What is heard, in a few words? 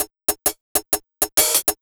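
130 jam hi hat, Ragga & Dub Reggae; USB Soundscan vol.27; AKAI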